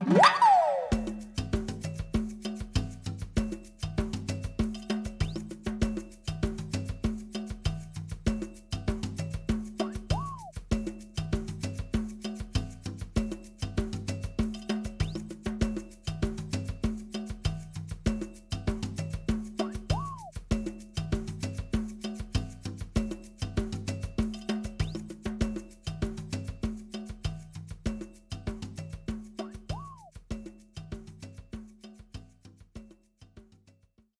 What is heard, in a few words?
Obtain Fanfare; Animal Crossing New Horizons